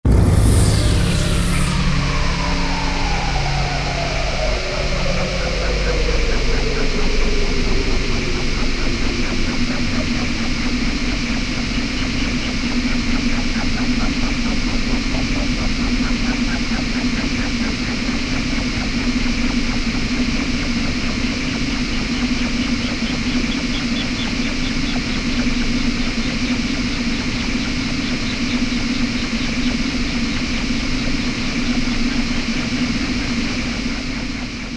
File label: space storm